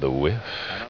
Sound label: whiff